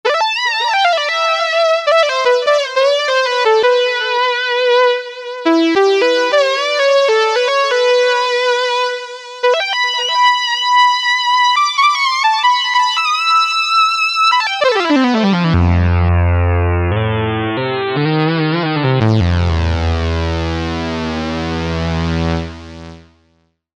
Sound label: DW8000 Lead, synth